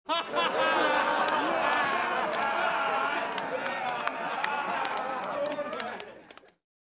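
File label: Crowd 50 With Cheers Applause; medium int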